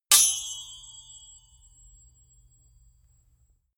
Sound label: sword hit sweetener